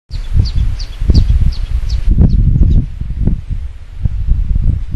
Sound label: Ochetorhynchus ruficaudus; Laguna Colorada; Jorge La Grotteria